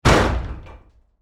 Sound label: door close bang